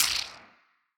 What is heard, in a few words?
Wider Clap